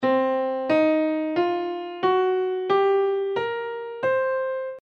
blues scale

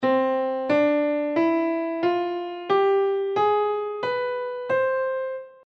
harmonic major scale

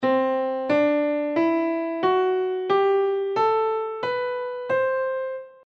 lydian mode

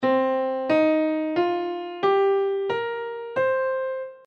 pentatonic minor scale

